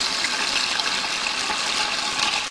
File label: sink noise; DELTARUNE